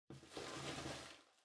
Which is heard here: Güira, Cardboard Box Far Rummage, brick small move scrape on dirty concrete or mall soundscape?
Cardboard Box Far Rummage